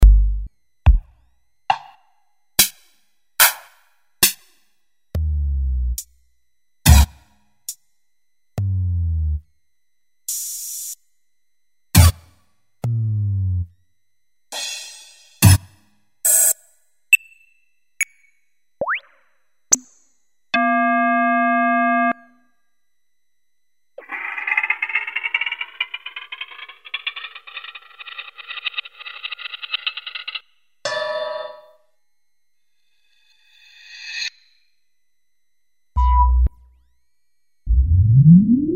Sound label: acid loop sfx; House Style Paris